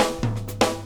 FILL, Grooves Filz Hitz

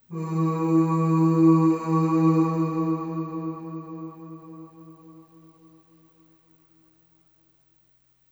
Ooh Short E2 Long Reverb; Harmonic Building Blocks

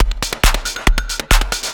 percussion loops 138, Techno; PS-45

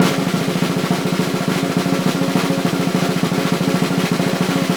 SNR, Synclavier Percussion 2 - World & Orchestral

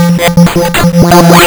lsdj waves; wavetables

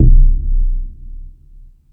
Tool Kit, Drum Machines E-MU Pro-Cussion

